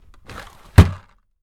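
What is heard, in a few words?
DRAWER close; 1000fx; Doors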